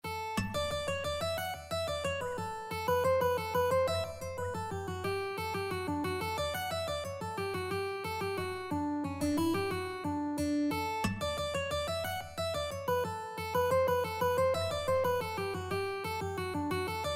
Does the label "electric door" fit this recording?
No